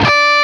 LEAD D, Guitar; East West; Steve Stevens Guitar Samples Collection

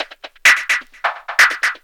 percussion, 130, drum loops; Ueberschall; Techno Trance Essentials; TRIBAL

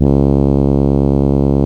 C#, Synclav FM, BRASS; Big Fish Audio

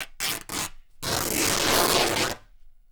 RIP, Canvas Bag Rips

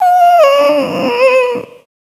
Dance Vocals, FEMALE

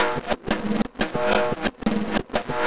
Snare Particle, Processed Drumkits

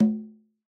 Conga L; Yamaha DJX-2B

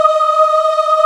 voices aahh; Sound & Vision - Gigapack I CD 2

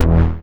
Synth Hit E

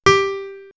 House Piano; X-Static Goldmine; backtothe70's

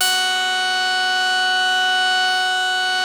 basses; Wizoo - Powered Wave

